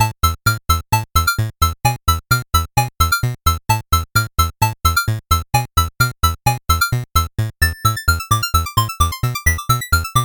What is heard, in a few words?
8bit bgm normal